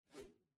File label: whoosh